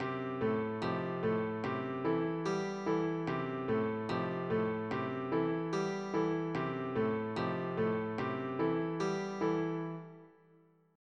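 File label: Game soundtrack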